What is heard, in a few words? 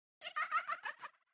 funny giggle